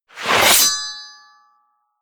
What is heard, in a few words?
scrape